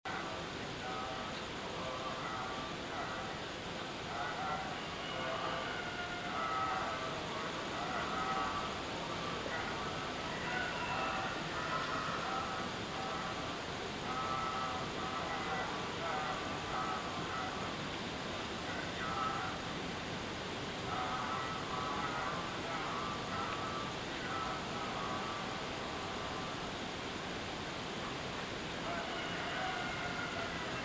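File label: dawn monks chant, Bangkok; south east asia street recordings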